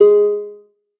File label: lyre